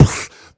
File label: Beatbox Snare, Human Beatbox